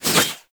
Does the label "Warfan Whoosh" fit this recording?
Yes